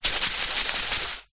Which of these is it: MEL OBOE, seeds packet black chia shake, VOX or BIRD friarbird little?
seeds packet black chia shake